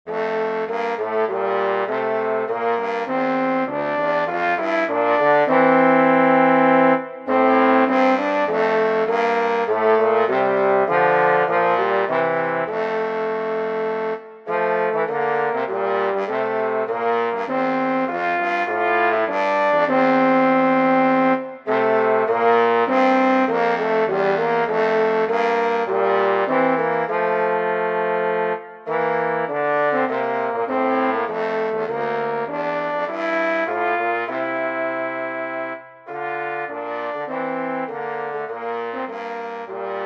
Brass for 2 3

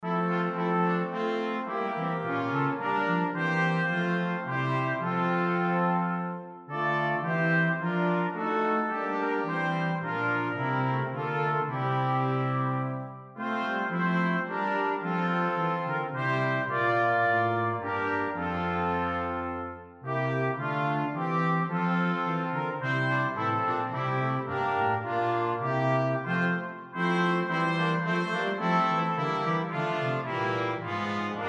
Brass Quintet; Liedboek; Sta op Een morgen ongedacht

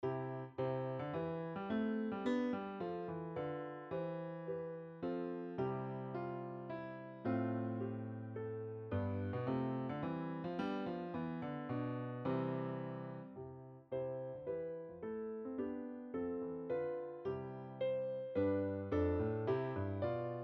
piano; Easter

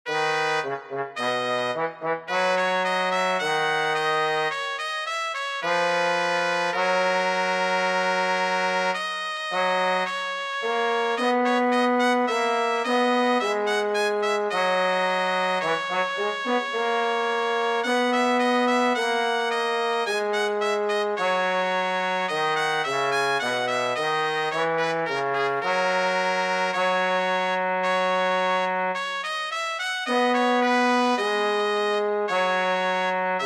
Brass for 2 3 trumpet and trombone

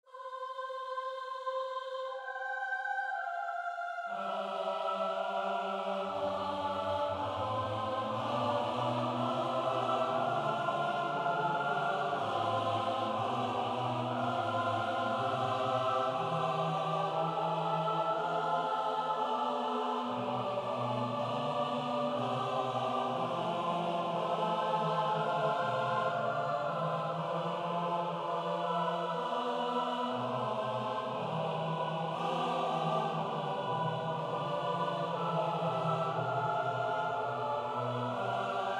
Motet Psalm 117

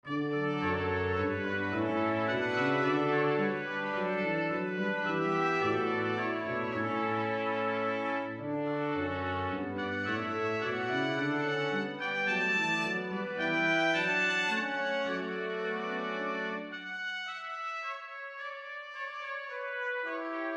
Brass Quintet; Liedboek; Lof zij God in de hoogste troon

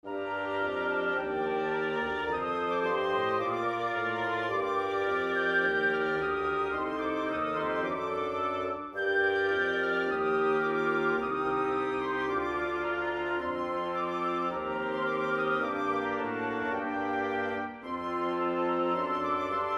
Wind Quintet; Autumn Song

